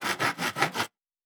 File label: Saw Wood; Fantasy Sounds Bundle; Craft